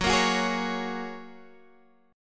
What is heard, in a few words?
G sharp 7th Suspended 2nd Suspended 4th F sharp